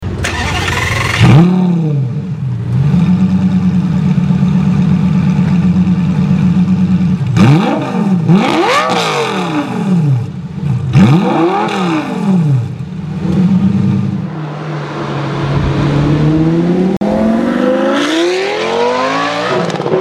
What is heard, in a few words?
Lamborghini Superleggera